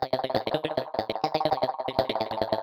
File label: Typing; fungus; TheHunter